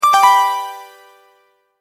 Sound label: multimedia tone, Goodbye; Tones; Sound Design; Multimedia Tone-Goodbye 36; McKinney Sound